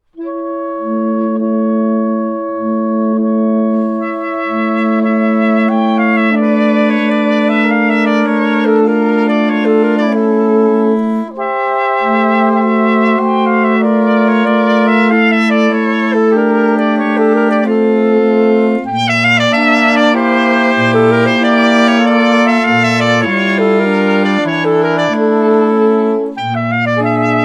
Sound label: Romanian Folk Dances